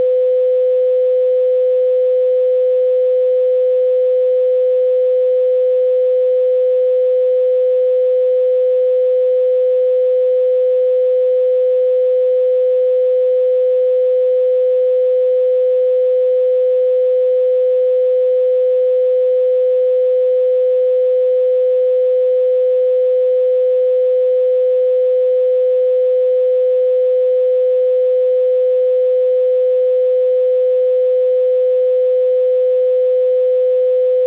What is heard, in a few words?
dial; Telsa Recorder Server; ivr